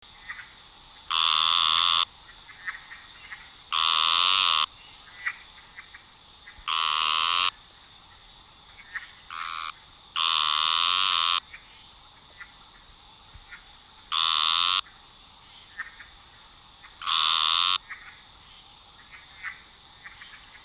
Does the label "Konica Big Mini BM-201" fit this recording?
No